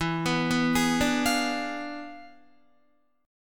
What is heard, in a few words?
E 7th Flat 9th, down, Guitar; E7b9 arpeggiated